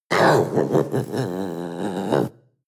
panther young growl

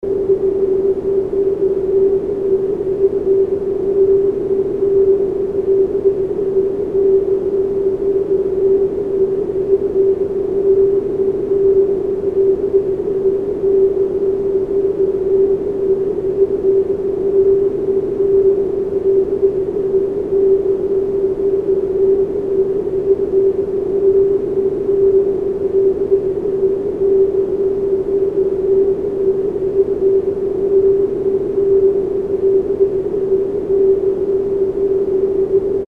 harmonic noise; sphera

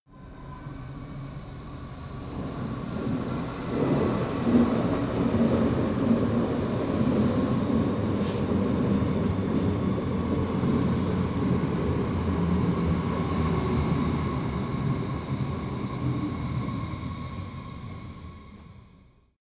train underground station arrive

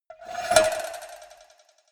cowbell scratch hit move hard

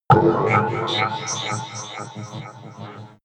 mechanic alien

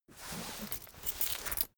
key ring mid size pocket fetch

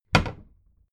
cabinet door close heavy

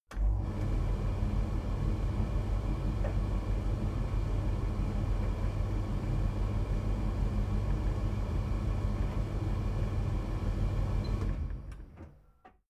washing machine tumble drier work

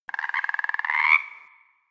frog call croak

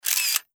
35mm camera single shot

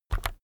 keyboard external key press fast soft

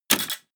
mechanical lever metal small down

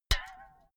filled can hit hard ringing dripping

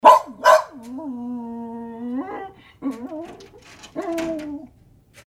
dog barking

